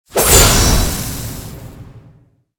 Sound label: sword split air